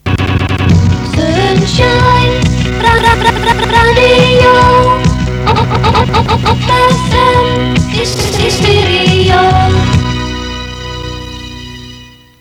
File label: sun Shine Jingle; SunshineJingles